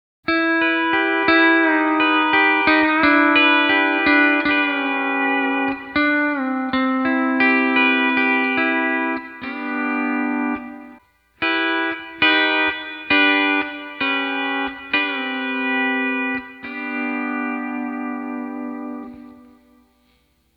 D13 Tab Pedal 4; LKL-O

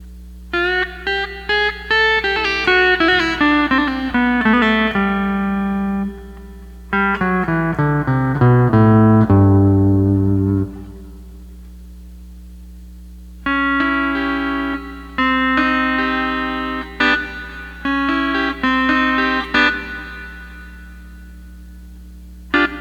12 String Lap

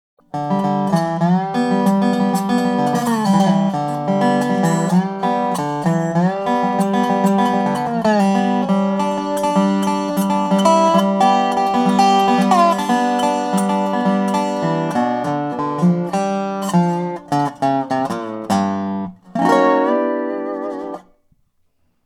8 String Dobro

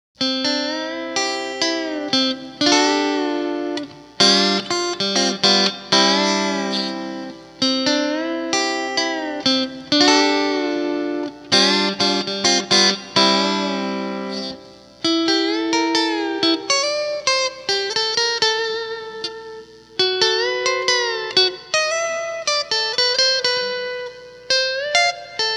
Solo

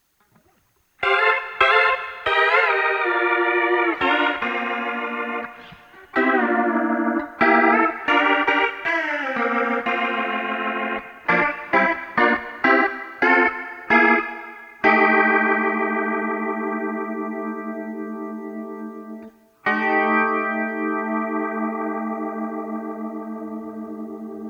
Neo Ventilator Direct Mono

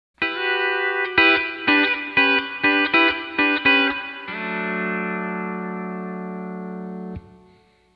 D13 Pedal 4; D13 Copedant Tabs

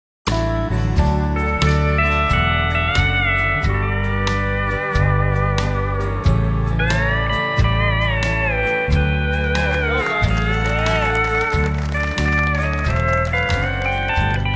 Steel Only

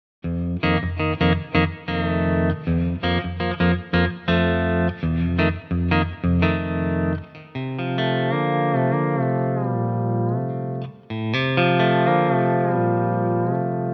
ToneX Pedal; MKV

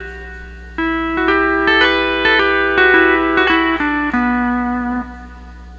12 String Lap

